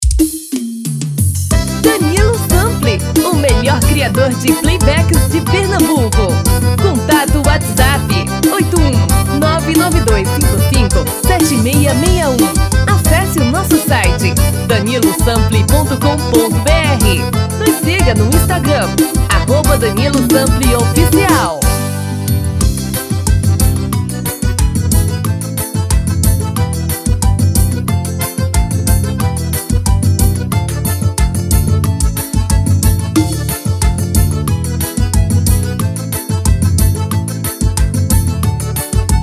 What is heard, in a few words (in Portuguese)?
Xote